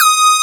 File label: PL MOOG; Wizoo - Powered Nord; STEREO LAYER